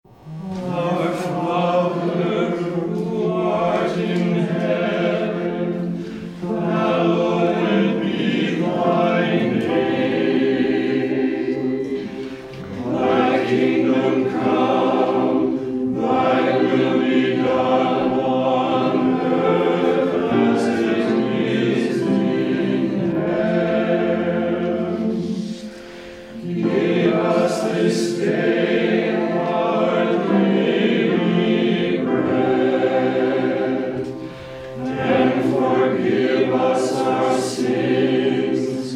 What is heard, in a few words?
Hymn; Our Father Who Art in Heaven